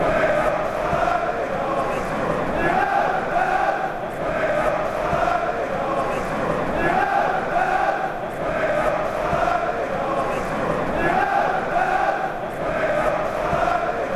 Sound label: We all follow the Arsenal